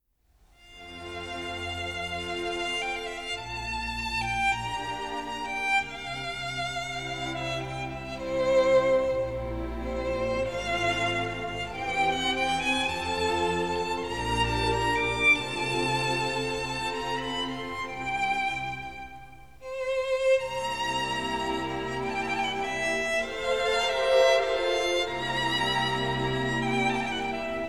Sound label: Violin Romance No.2 in F major